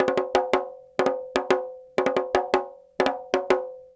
Petia, World Percussion

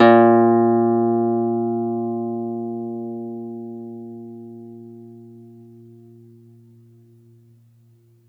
laute big; SoundBytes; Early Patches

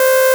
Synths Root C

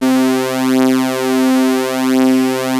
OB C4, Rare Synthesizer; Hollywood Edge - Giorgio Moroder Rare Synthesizer Collection; OB 4 VOX.

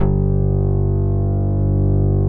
SYNK; Greytsounds - Scott Peers Sound Engineering

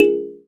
KALIMBA; Spectrasonics; Heart of Africa; KALIMBAS

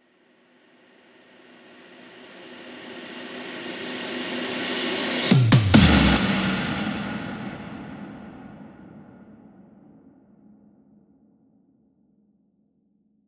140 BPM Intro Drum Fill; Vengeance - Essential Clubsounds Vol.5; VEC5 140 BPM Vengeance Intro Kit Root E; Drums & Perc

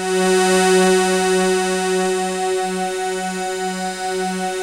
NOIZ SYN; Masterbits; Vintage Voltage; SEQ-FX

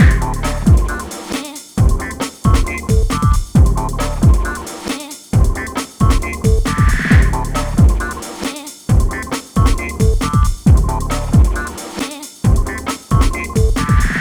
Hardcore Breakbeat, 135 Dmin; Big Fish Audio; Hardcore Breakbeat Action; Gimme Soul

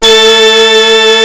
OB 19 A4, Rare Synthesizer; Hollywood Edge - Giorgio Moroder Rare Synthesizer Collection; OB 4 VOX.

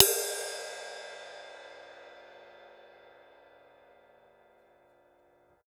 CYMB; Houseworx; Drums; Cymbal

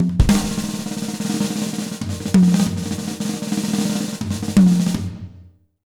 100 B BEAT, Jazz Latin Drumloops; USB Soundscan vol.08; AKAI

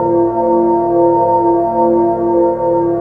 Nine Bells Eb, Pads; Zero-G; Total Drum Bass

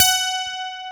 12 STELAG G4, EL-AC12; AKAI S6000 CD-ROM - Volume 1

